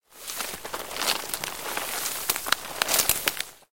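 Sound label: careful rustling of leaves underfoot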